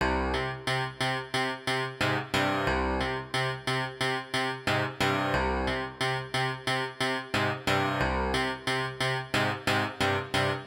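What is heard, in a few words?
090 Hip Hop E-Piano C minor; Pro Tools Essential Loops; Digidesign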